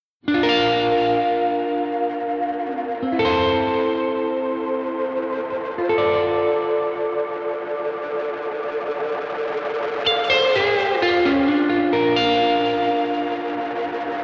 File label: Guitar; Galaxy Wild Tails; UAD Spark